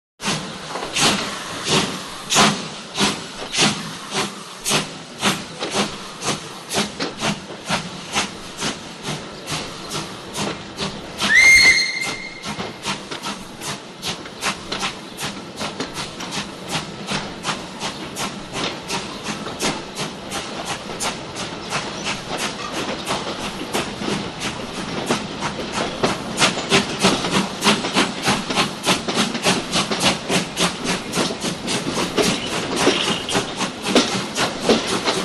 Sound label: Train Start-Away (Exterior) Steam Train Start And Away W; Hollywood Edge 3-D SFx